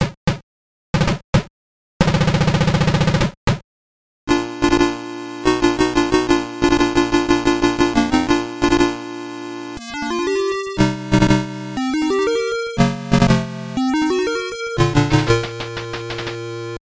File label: Fanfare; Alien 3; Game Gear